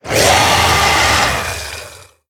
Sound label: Zombie Monster Die; Undead Collection